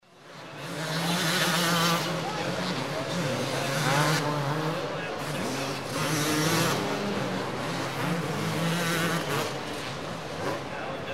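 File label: motocross motorcycles riding on the track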